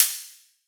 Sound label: Hat; Hat 2; exciplex sample presets pack; JinoBeats